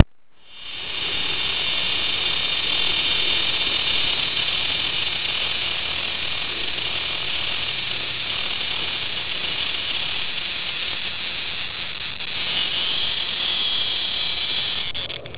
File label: chloromera alarm, cicada